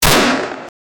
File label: shotgun shot